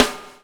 BUBL, BUBBLE; Spectrasonics - Backbeat

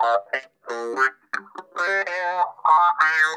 CARTOON, Funk Guitar; Zero G - Funk Guitar

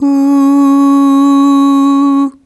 Ooh Short C#3 Dry; Harmonic Building Blocks

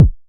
Click; Clicks

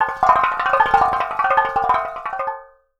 TRICKLE D; Voice Crystal - Steve Reid Definitive Percussion; ERIE FILM-Q

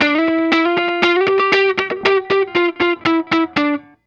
Guitar, 70s Analog Funk; Sonic Foundry (Sony Creative Software); What it Is! 70s Analog Funk

